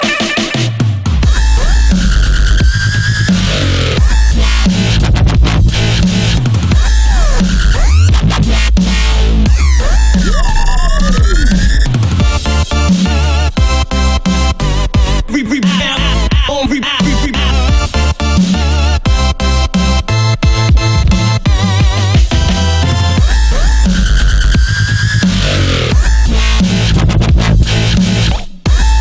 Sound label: dubstep, ringtones; electronic